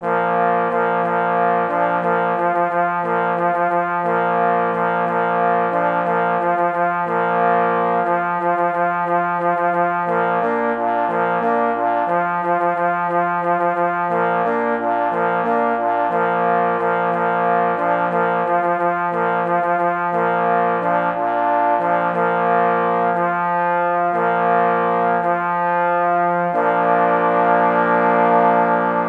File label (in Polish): Hejnal na 3 parforsy; F. Nowowiejski; Wyjazd na polowanie